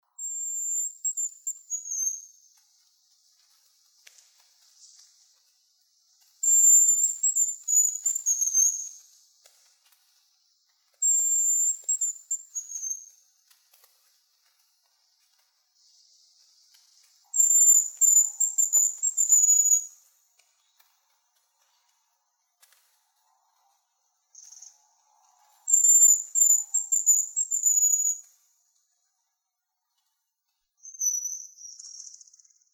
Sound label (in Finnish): pyy